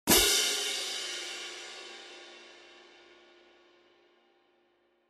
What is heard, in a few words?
20in Symphonic French Tone Pair, cymbal